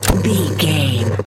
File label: radio tv electronic device turn on